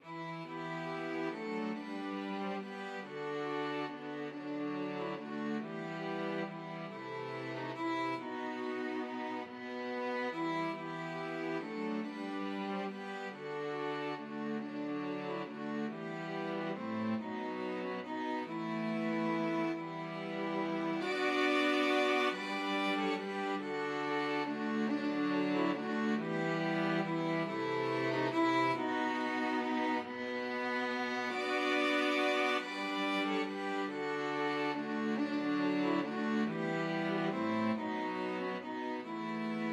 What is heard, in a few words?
string quartet